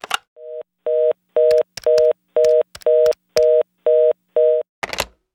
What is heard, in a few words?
Office Phone Sequence; blindside